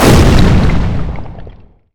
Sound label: stone